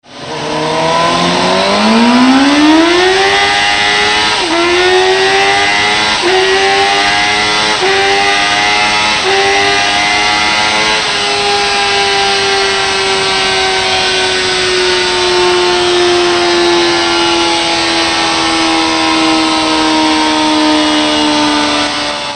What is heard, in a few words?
Suzuki GSXR750, motoskanas